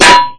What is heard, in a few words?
cleaver hit wall, weapons